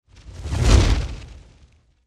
Fireball Catapult, Fire Catapult Whoosh Pass By Heavy; Flames; Sound Design; Flame Sound Effects